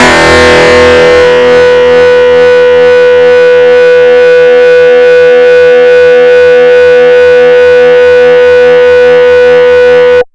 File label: DIST STACK; Big Beat Samples & More; AKAI